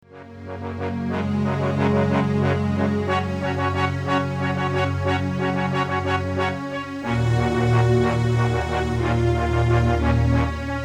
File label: horns